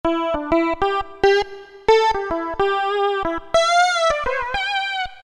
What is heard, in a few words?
Pulse Lead; Roland PN-D50-03